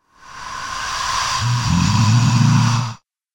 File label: Zombie